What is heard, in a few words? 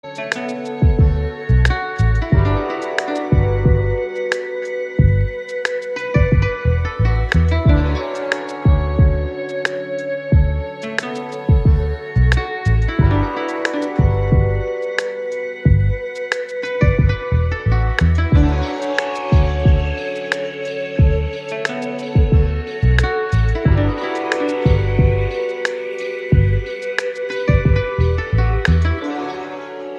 Eastern Nostalgia; MIUI12